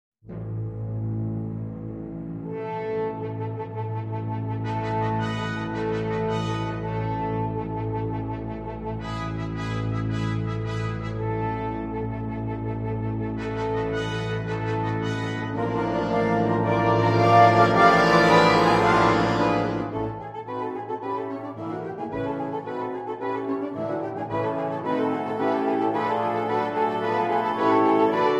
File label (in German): Einzugsmarsch, Fanfare